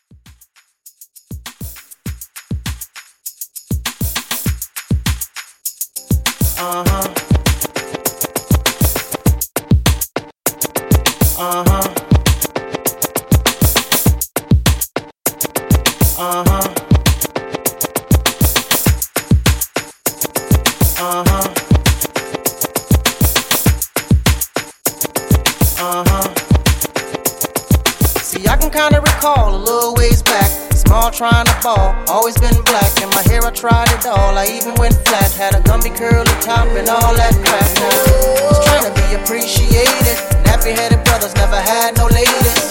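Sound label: RB with Redrum 100 Bpm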